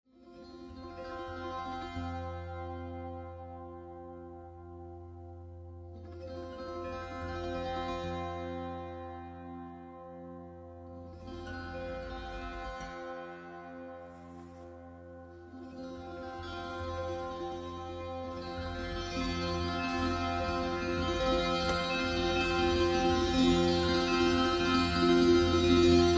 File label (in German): Tamburina, Stimmung c‘-f‘-f‘-f (F), dynamische Spielweise; Körpertambura® (Body Tambura)